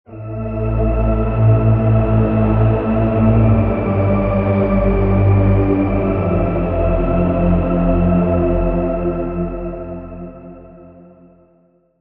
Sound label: Pad - Murkiness; Routed Sound; Vital Expansion - Quantum Enforcer Vol.1